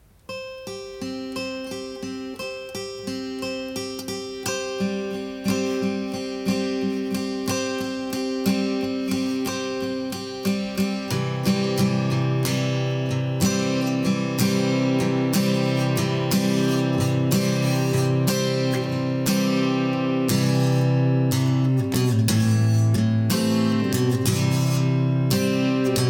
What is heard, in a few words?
Hallelujah